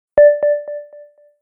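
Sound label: startup, sony ericsson phone sounds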